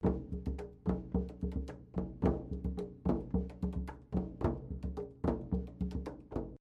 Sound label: Indian Tutti; Outdoors Old